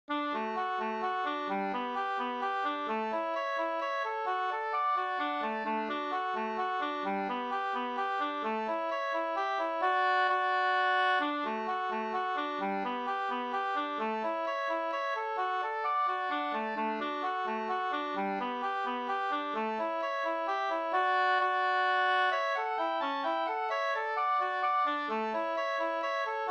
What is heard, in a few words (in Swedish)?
hambopolkett; Taglaten Malm-Lasses ruckare Sd 2st